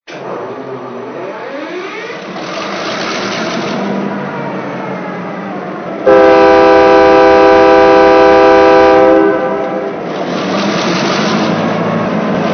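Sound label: MP15AC, locomotive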